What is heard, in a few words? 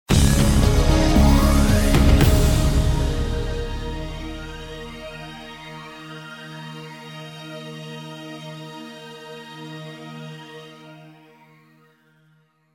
BBC Four News Stab with Long Hold